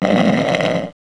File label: snore; mgs; radio